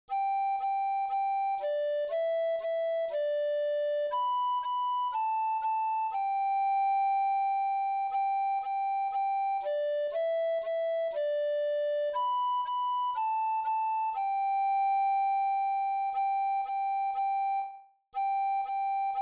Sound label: old macdonald recorder